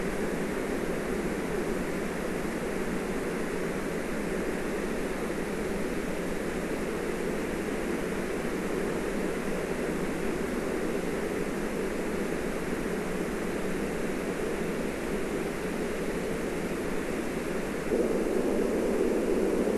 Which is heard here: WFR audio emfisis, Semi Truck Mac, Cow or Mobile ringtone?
WFR audio emfisis